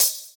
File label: Open Hhat, Hihat; Equipped Music; Loungin House